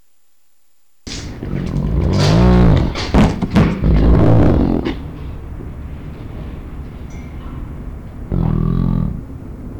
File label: hyena eeyore empty